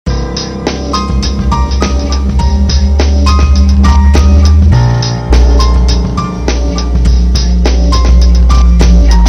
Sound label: Loop